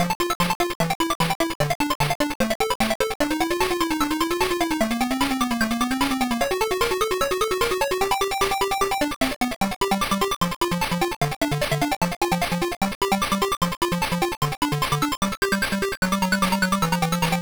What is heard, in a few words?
Puzzle BGM; Pepe's Puzzles; Pico